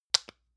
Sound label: lotion lid close hard; berufe